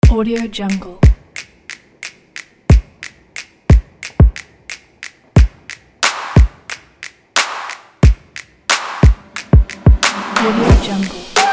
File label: percussion for fashion; watermello